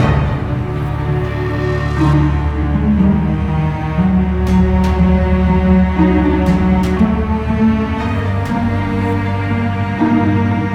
Dungeon Level loop; Rezrog

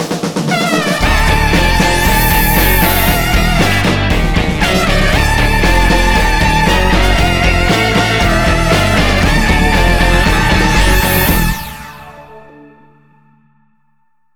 End Mid Wave

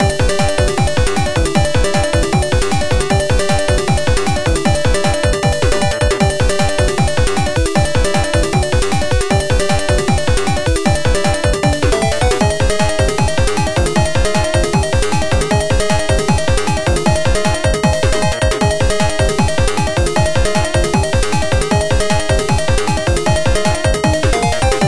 BGM, PC-98 OPN; rankou nyotai tsuri mokkori man no nani de nushi tsuri pc 98 windows gamerip 1995

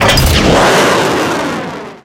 rocket takeoff

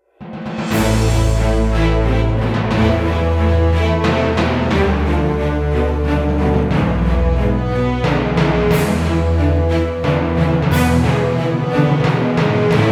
Non Fighting Scenes

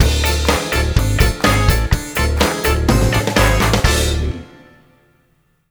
STAB

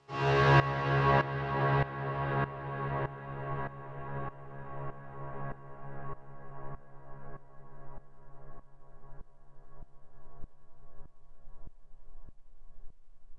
Overlay Dark

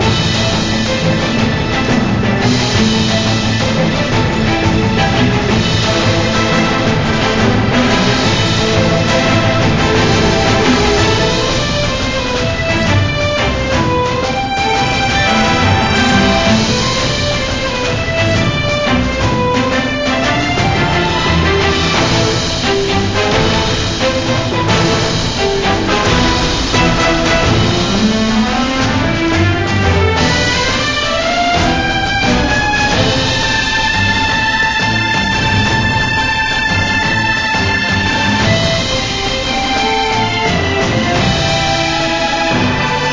bgm raid main; sky of crystalia; android game music

